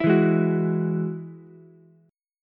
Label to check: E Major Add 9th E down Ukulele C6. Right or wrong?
Right